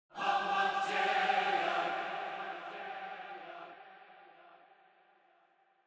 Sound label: MUSIC